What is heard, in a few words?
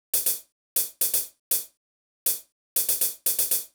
chh lax; hydrogen